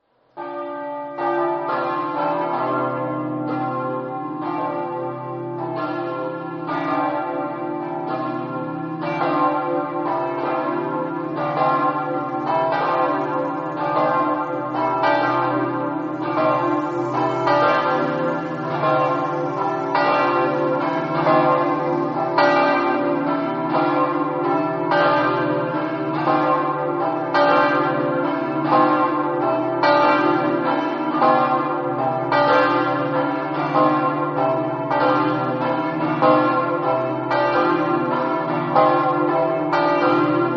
premariacc, campane

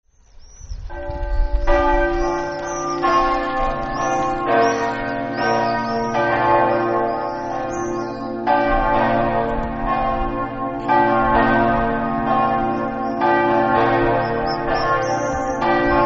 codroipo, campane

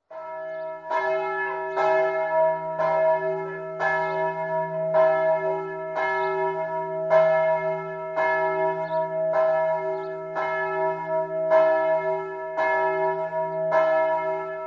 mersino, campane

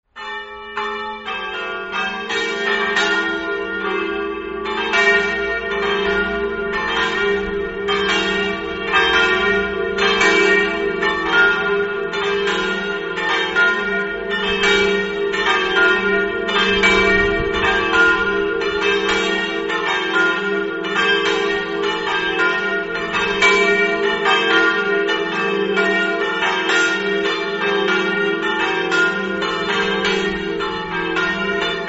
sanguarzo, campane

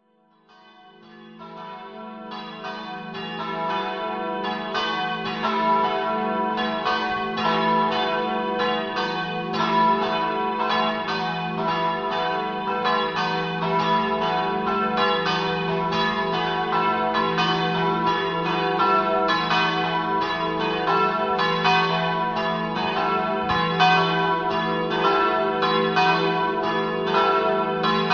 risano, campane

